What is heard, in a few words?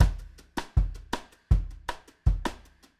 Drums, Jazz